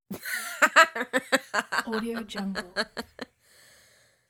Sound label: young teenager laughing